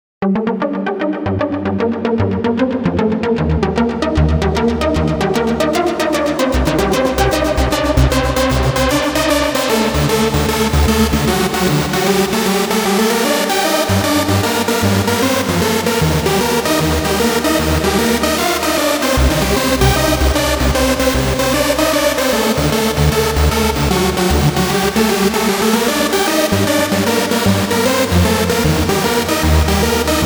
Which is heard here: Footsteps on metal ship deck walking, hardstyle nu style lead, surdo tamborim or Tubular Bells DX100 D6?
hardstyle nu style lead